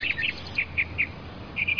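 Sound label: mockingbird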